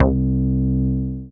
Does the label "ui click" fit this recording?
No